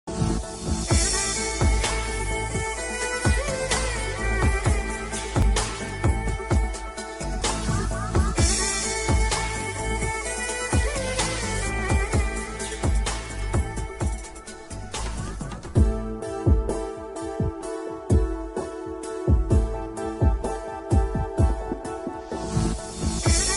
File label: romantic bgm telugu romantic bgm; Krishna and his Leela